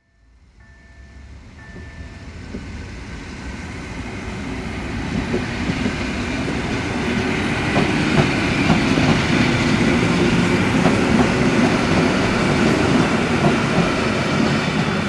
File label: Slowly Passing Train